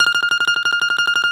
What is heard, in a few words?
BALALAIKA, Russian Strings